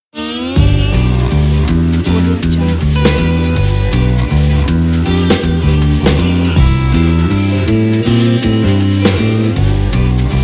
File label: rock n roll logo